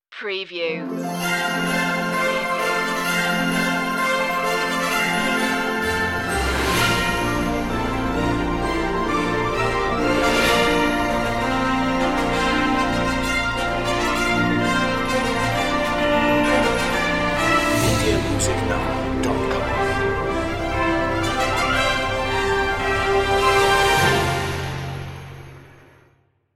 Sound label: intro no choir